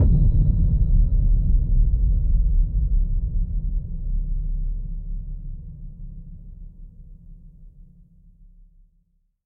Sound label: boom; ice age scrats nutty adventure; Level4 Hidden World